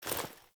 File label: Female Foley Action Default Melee Swipe, Female Default Clothing; Characters; Player